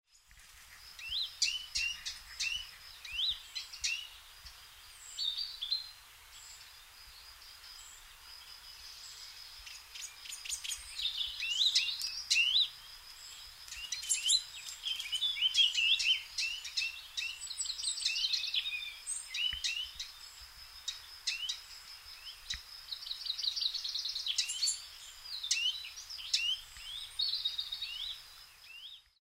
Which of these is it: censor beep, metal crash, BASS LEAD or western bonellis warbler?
western bonellis warbler